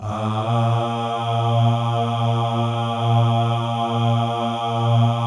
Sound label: MALE AHS, Symphony of Voices; Spectrasonics